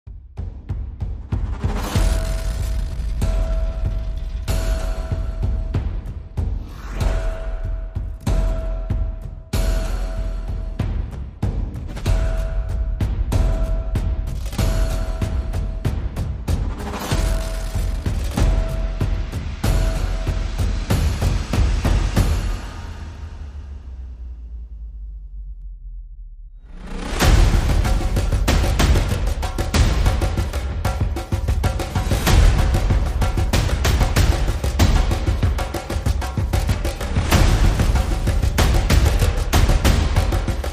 Percussion and Effects

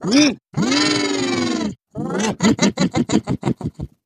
Troll Laughing

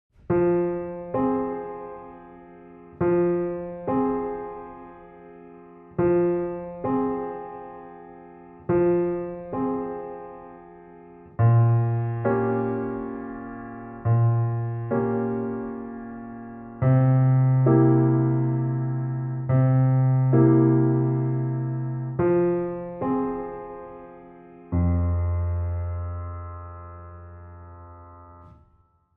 Sad Piano Underscore

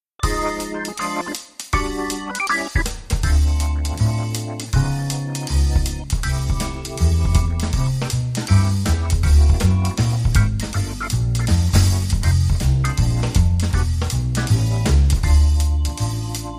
No Horns

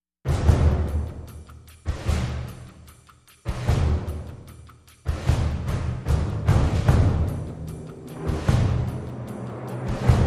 Percussion Only

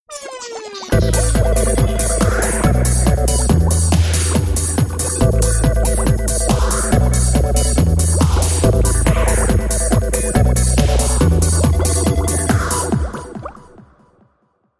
Bubbles

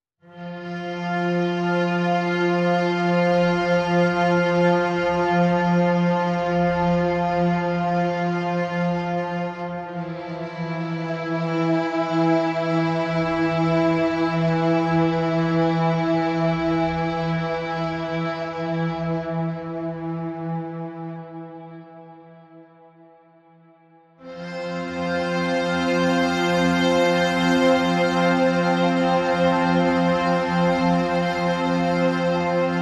Mid Strings

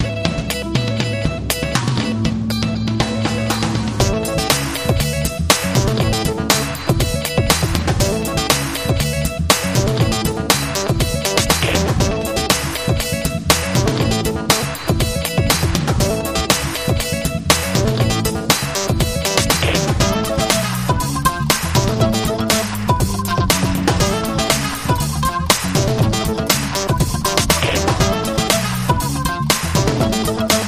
Funky Party

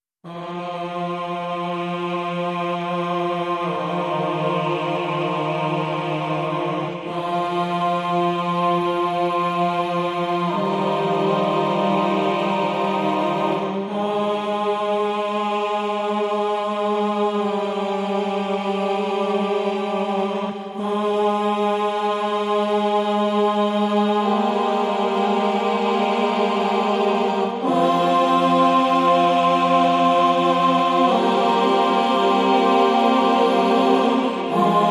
Choir Stem

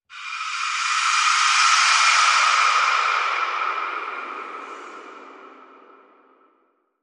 Poltergeist Breath

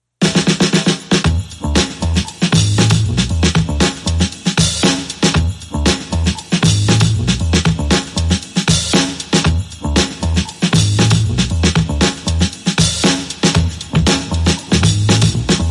Drums and Bass Mix

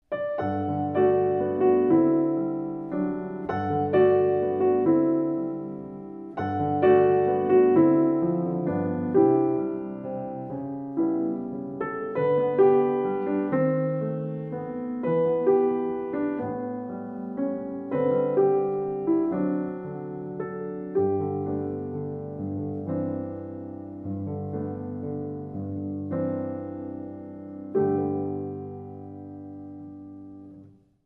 Solo Piano

